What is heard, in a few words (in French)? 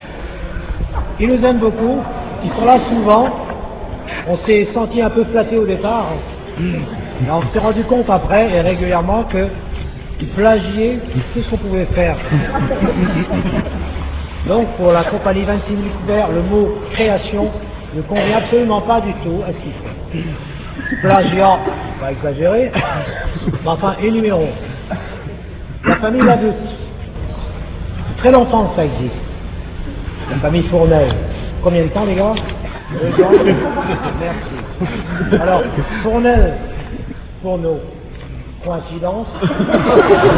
chorales